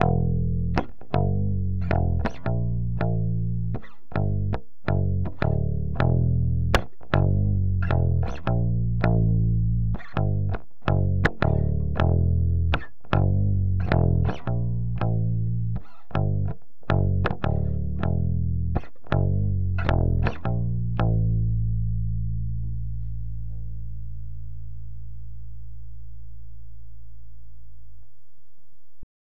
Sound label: basse